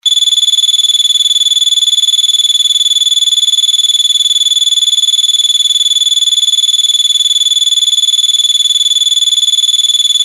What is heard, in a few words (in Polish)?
ton MIDI